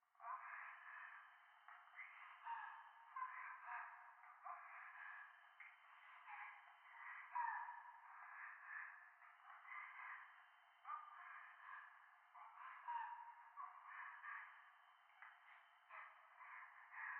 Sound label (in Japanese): 教会 右耳密着処女喘ぎ 山奥